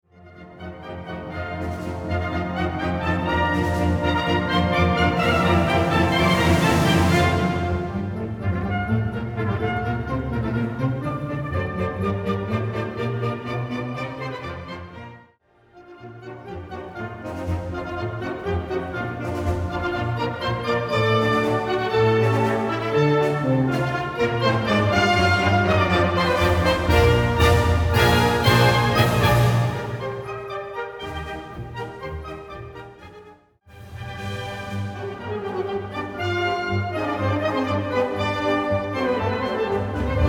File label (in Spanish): pasodoble marcha; CADIZ